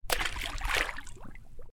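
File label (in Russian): звук жизни который камень бросает в воду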